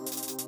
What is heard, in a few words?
Hat Roll, Drums; BB 2HTC Samples Vol 4